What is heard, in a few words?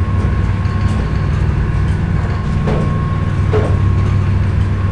Elevator Ride Loop